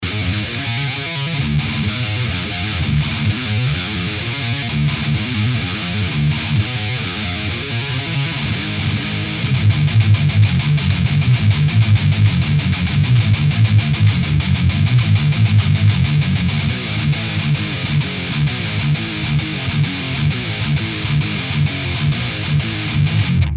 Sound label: GTR